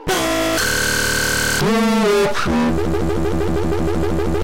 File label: Grind Vox